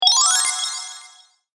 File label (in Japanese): 魔法詠唱